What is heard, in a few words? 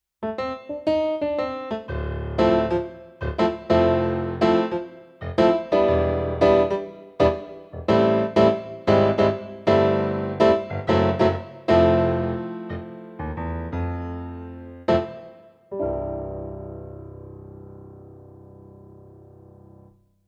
Thomann SP-320 Bright Piano